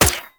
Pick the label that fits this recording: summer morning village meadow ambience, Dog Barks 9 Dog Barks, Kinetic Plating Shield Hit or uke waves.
Kinetic Plating Shield Hit